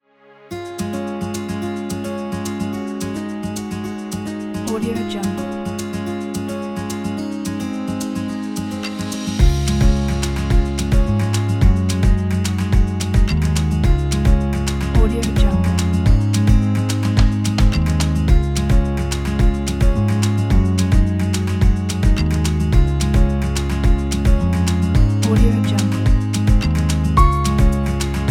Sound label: food vlog background; colorfilmmusic